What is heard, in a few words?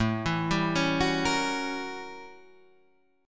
A 13th Flat 9th A, guitar chord; A13-9 Chord {5 7 5 6 7 6} arpeggiated